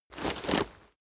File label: leather metal handling short